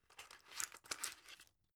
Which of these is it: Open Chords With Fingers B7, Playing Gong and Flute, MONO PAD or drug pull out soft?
drug pull out soft